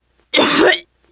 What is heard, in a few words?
cough, teengirl